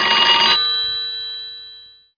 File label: ALARM